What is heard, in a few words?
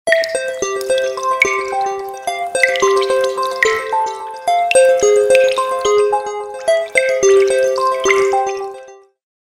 Song of rain, ringtones; Lenovo; A806